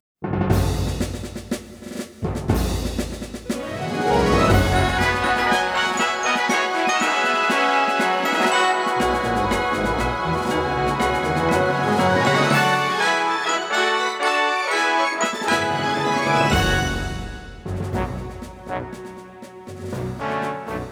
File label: Opening Ceremony Fanfare; A-Train 6; Welcome To New City